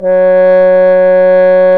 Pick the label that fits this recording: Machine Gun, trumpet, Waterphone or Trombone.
Trombone